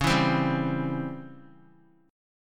Db Minor Major 7th Flat 5th, Guitar; DbmM7b5 strummed

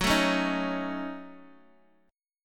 F sharp Minor 6th, F sharp, Guitar, Open D; chordshape; down

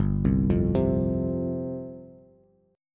G 7th Suspended 4th G down Bass; G7sus4 arpeggiated

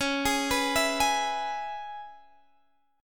Db Minor 7th, Guitar, DADGAD; arpeggiated; x,16,18,16,19,18; Dbm7

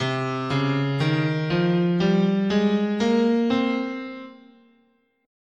C Phrygian, scale

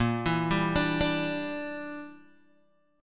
Bb 6th Flat 5th Bb, Guitar Open G

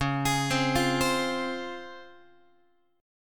Db Major 7th Db down Guitar; DbM7 arpeggiated; JGuitar

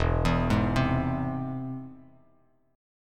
E Augmented, Bass Standard; E+ arpeggiated; JGuitar

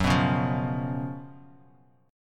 Gb Major 7th Suspended 4th Gb Bass Standard; GbM7sus4; strummed; 14,14,11,10; JGuitar

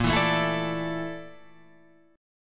A sharp Major 7th Flat 5th A sharp

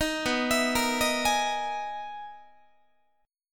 B Major 13th, down, Guitar, BM13 chord; arpeggiated; JGuitar

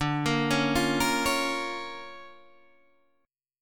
D Minor Major 7th Sharp 5th, D, Guitar, Open D

